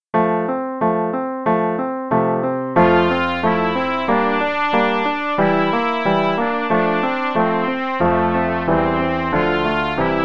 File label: Shepherd's Delight (no click, qn=92) no dynamics, trumpet; The Really Easy Trumpet Book